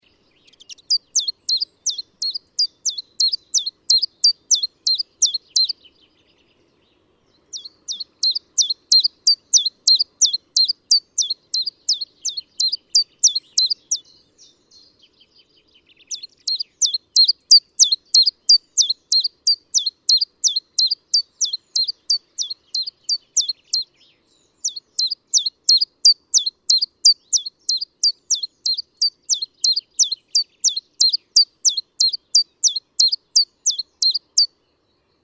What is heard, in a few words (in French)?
Pouillot veloce